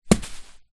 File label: leisure juggling bean bag small land on carpet and roll; zapsplat